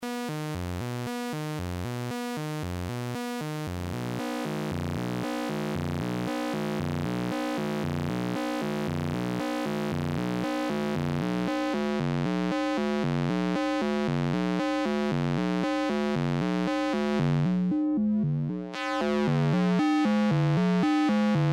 Serge NTO Freq Shift; Frequency Shifter; SergeModular; Frequency Shifter Samples

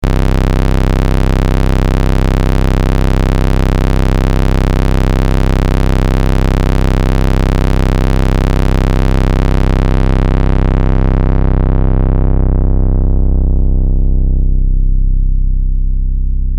MS-404 PWM & Filter LFO's; Doepfer; MS-404 soundfiles

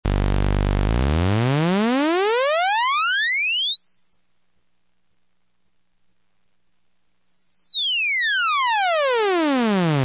SAW sweep; PSIM-1

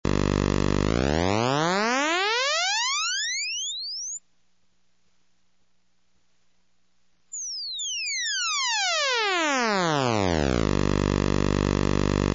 PULSE sweep; PSIM-1

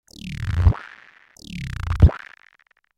Buchla 200 BPF Skritch; buchla modular

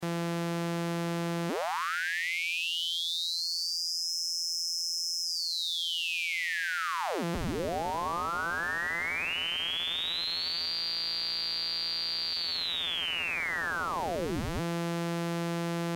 Linear Shift, Frequency Shifter; Modcan; Frequency Shifter Samples

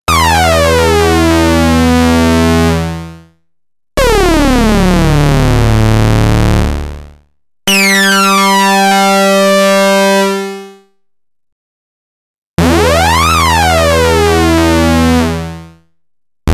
Osc Sync

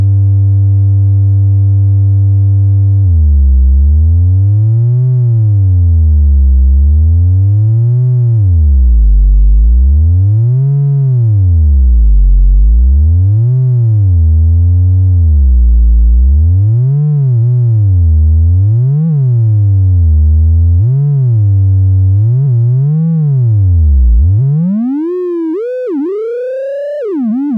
psycho lfo on triwave; cgs synth

